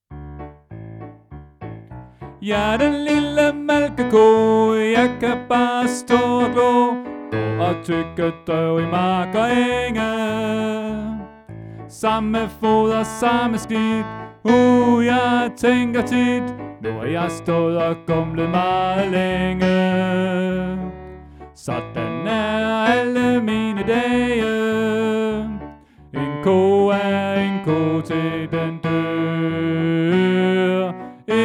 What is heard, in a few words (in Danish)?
Tenor